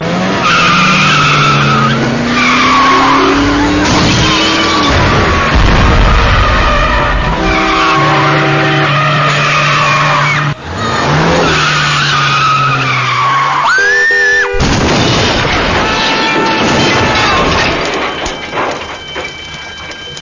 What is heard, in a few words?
car keys